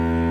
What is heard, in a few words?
Cello short Note E